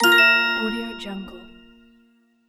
user interface effect

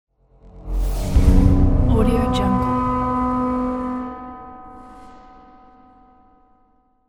bowed whoosh

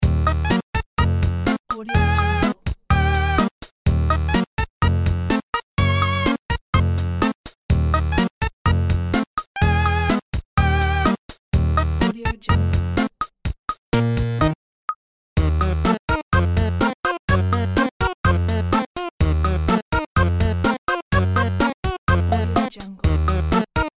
retro game music; vaanfomm

